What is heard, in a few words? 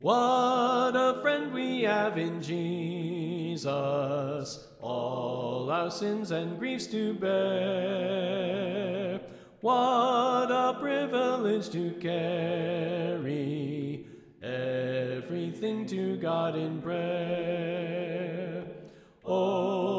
What A Friend We Have In Jesus Soprano Left